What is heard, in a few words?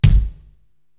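bass drum Dance Hall, Korg TR-Rack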